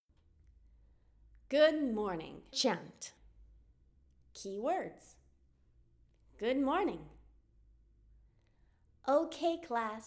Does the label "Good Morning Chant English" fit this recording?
Yes